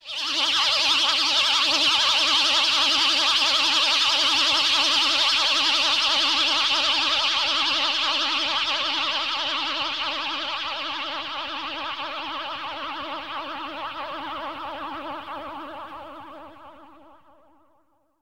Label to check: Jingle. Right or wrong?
Right